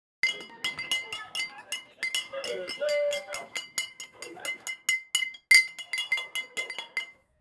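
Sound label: a group of people cheering, pub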